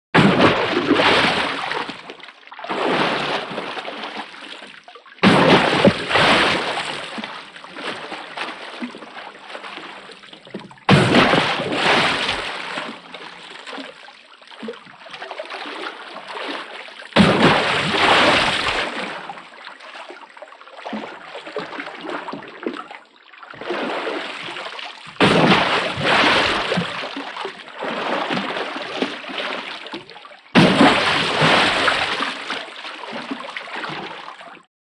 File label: Splash Body Water